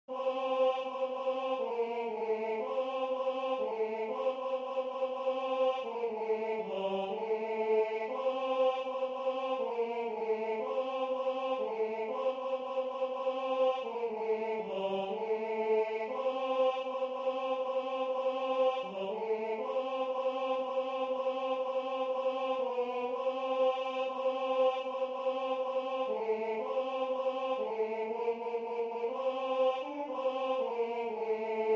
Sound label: Tenor; Deck The Halls